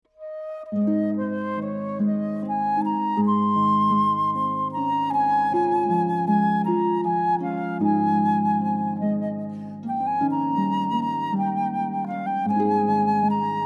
harp flute cello

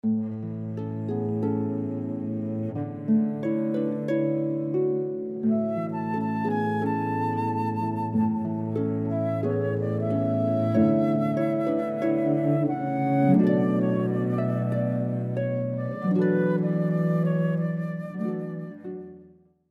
harp flute cello

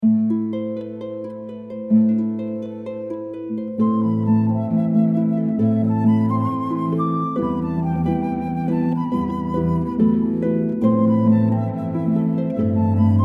harp flute cello

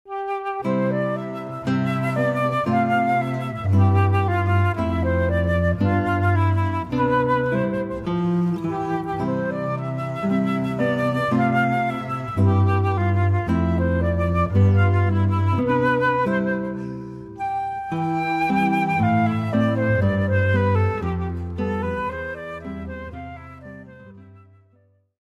Sleepers Awake, guitar flute bass trio

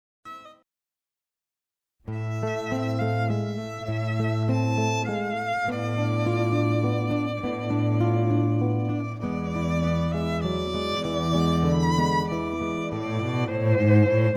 Trio of Guitar, Violin; guitar violin cello; Siciliana

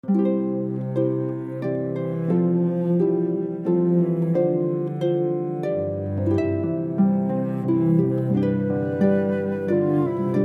harp flute cello

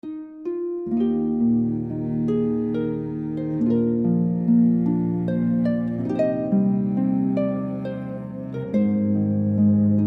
Hymne, harp flute cello

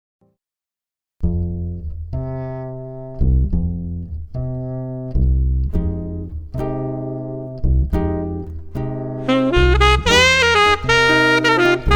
Song Jazz Trio

